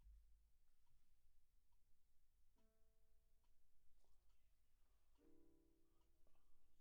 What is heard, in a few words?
Kit